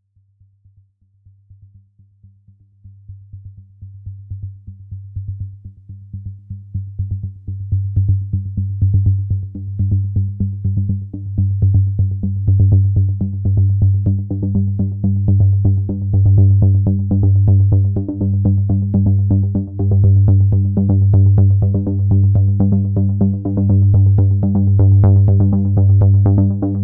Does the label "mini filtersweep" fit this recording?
Yes